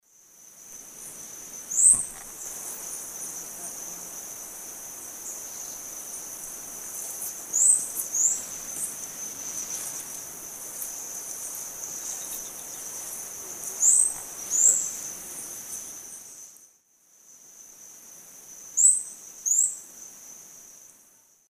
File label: Picumnus lafresnayi, calls; S. America; Eastern Lowlands